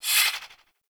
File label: Swing, Weapons Melee; Warframe; Modular Melee